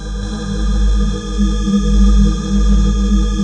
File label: SCARY, Prophet; Rubber Chicken Software Sample Bank; SCI PROPHET